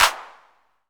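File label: CLAP909, TR-909; 90 s Sample CDs; Sound & Vision - Gigapack I CD 1 (Roland); TR-808 & 909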